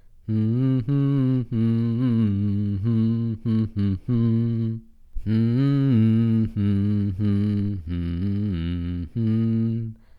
sing; Hollow Knight: Silksong

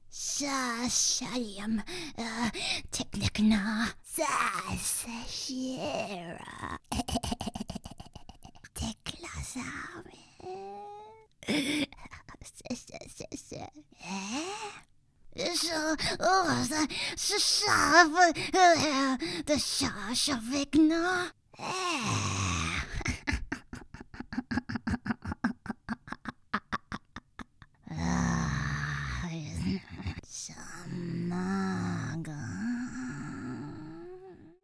rummage loop regular; Scrounge; Hollow Knight: Silksong

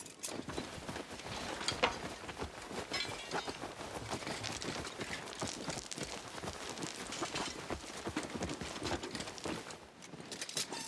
rummaging loop

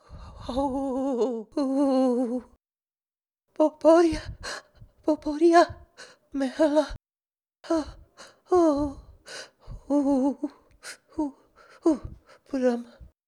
scared in home loop; Hollow Knight: Silksong; Frey

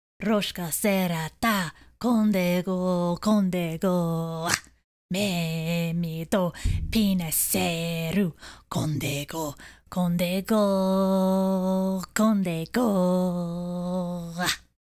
Female Sing

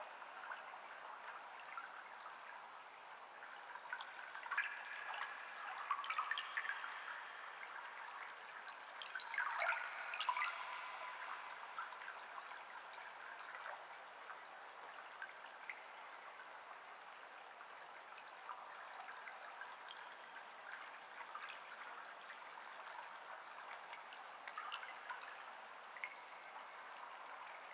lake atmos

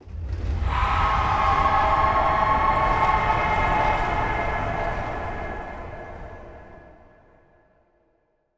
wake up; Hollow Knight: Silksong; citadel

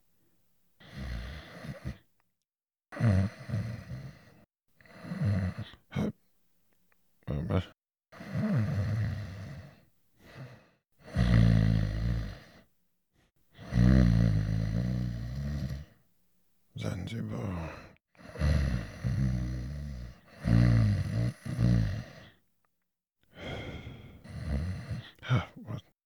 sleep loop; Hollow Knight: Silksong; Garmond

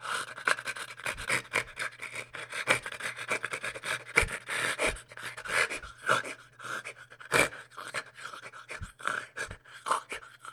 vampire gnat idle loop

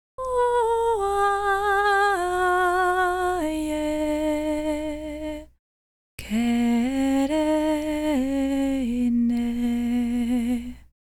female song; Female-NPC-D; Female-NPC-D-Song

